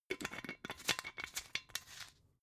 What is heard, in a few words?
glass bottle massive close scratch